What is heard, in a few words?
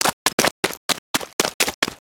oil slip; weapons; aronwk collection; ndaudio